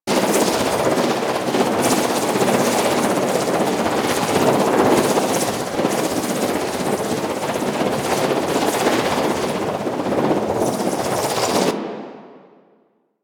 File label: Axe Hover Loop; Sundial